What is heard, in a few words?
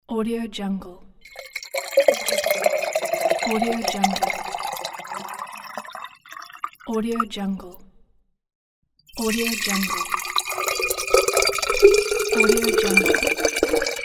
pouring drink into glass; galikhe